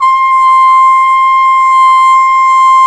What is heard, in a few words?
BRS CPM TP, Cup Mute Dry